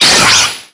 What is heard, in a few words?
transform start; Snakefruit